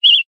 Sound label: bird chirp generic; animals